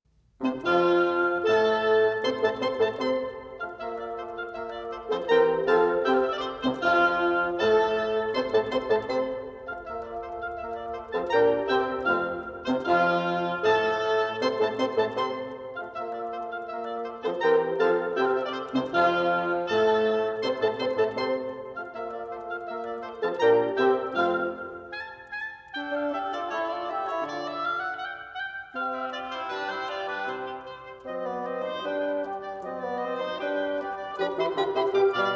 Divertimento for 2 oboes, 2 horns & 2 bassoons in F major; Mozart (Wolfgang Amadeus Mozart) 1756-1791; Divertimento No. 13; K. 25; 25 More Mozart Favorites